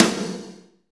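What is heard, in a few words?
snare, Power Ballad; Northstar; Drumscapes Roland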